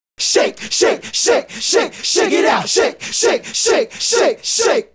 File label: Male Group, Vocal